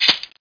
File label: sticky